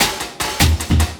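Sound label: loop snare drum, 150 BPM, drum & bass; Ueberschall; Drum & Bass Resonance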